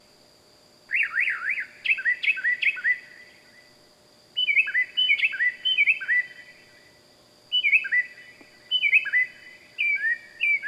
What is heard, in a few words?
Loud Night Bird Animals Wild